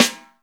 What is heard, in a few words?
FUSION KIT; Northstar - New Gold VOL-1